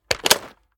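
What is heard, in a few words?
sniper reload mag out; gmod; iw8; xmike109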